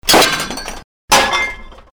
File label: glass smashing